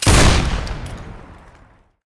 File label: shoot; EMXArms; emx draftsman